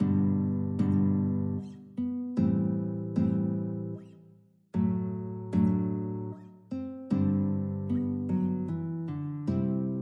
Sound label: guitar